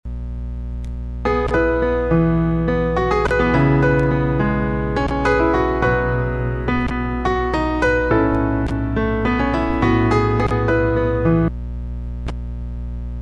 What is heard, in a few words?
Music BAD; RX2